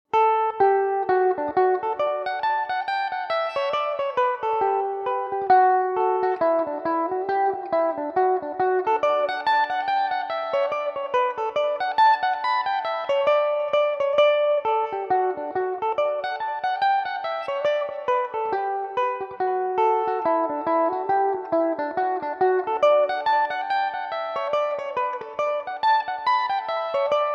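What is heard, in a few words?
hornpipe D major belmuse, irish mandolin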